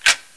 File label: deagle clip slap